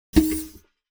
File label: neutral bot pinbal tone; mixkit